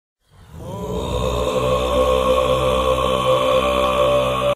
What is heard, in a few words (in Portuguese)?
canto mongol